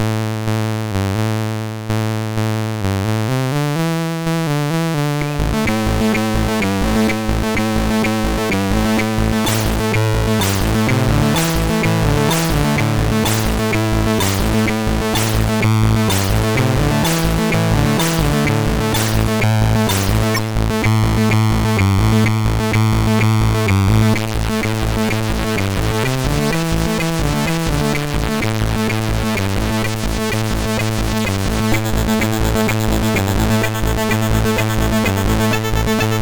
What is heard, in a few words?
Savage Noise, sid MOS6581R3